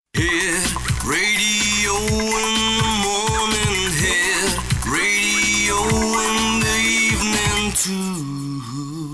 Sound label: radio; gta2